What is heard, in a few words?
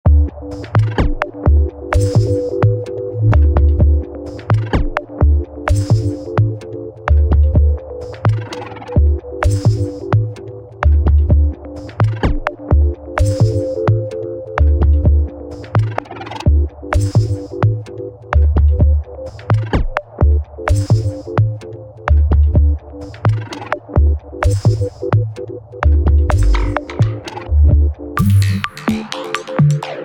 processing wait music